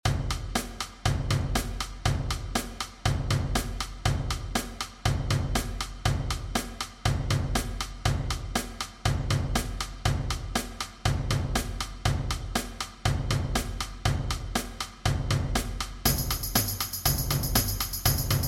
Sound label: perc